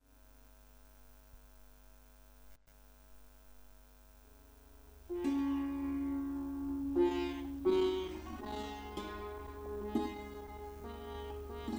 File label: santoor, kashmiri sufi music